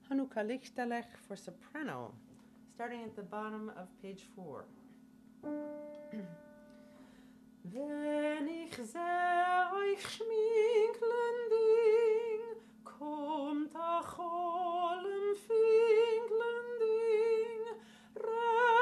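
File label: Hanukah Lichtelech soprano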